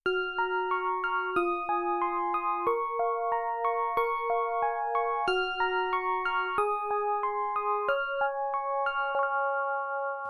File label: Lovely Music Box